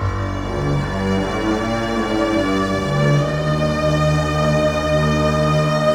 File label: strings, Sad